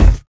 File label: physics cube sm; Portal 2